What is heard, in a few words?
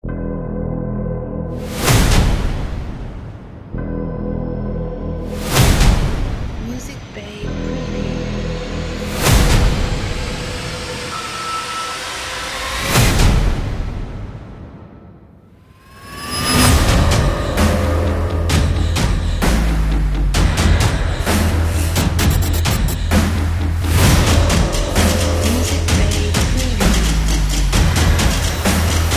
Hybrid Drums Epic Trailer